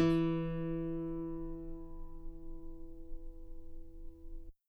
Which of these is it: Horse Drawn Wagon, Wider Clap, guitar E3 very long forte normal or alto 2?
guitar E3 very long forte normal